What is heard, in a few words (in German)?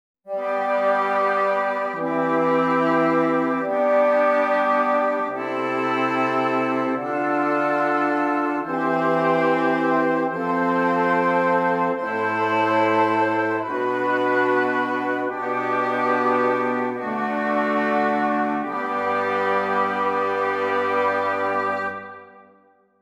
IV Stimmiger Contrapunkt; Ronge; con le correzioni